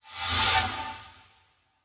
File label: Banshee Missile Homing Loop End; Evolve; Elder Kraken